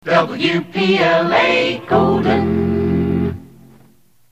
jingle golden; WPLA

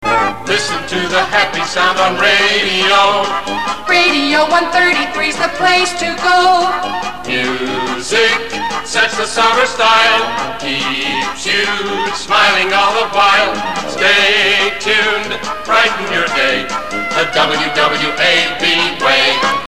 jingle; WWAB

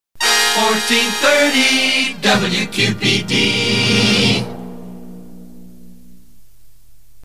jingle; WQPD; 1430 WQPD

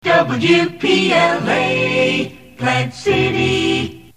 jingle ID acapella; WPLA

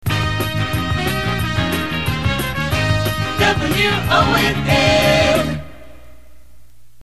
jingle; WONN

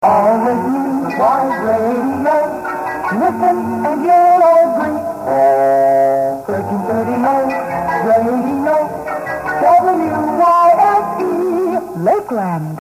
jingle; WYSE

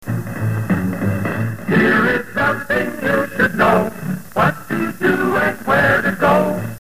jingle bulletin board intro; WYSE

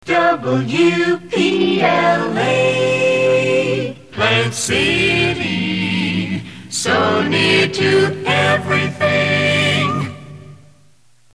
jingle so near to everything; WPLA

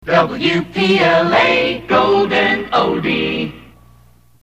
jingle golden oldie; WPLA

